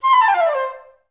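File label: flute run down C3; Best Service; Peter Siedlaczek's Advanced Orchestra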